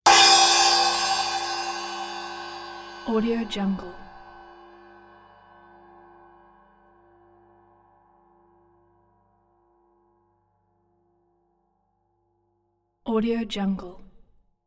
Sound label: cymbal 14 hi hat top polished mallet; zildjian